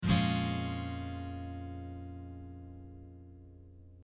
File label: B♭ m7